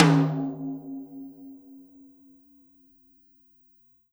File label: Tom Shard; ZeroGravity Drum Shards